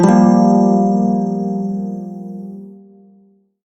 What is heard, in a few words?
Shawzin Blues Chord; Warframe; Prime Shawzin